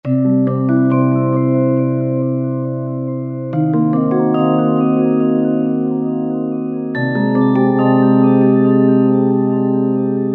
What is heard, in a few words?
Reversed Glck, Roland V-Synth; Factory Patches